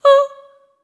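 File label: staccato C#5, soprano, Voices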